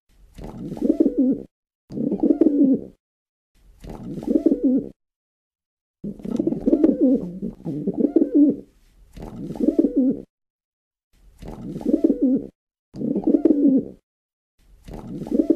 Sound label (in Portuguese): Canto do Pombo